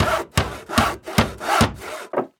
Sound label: upgrade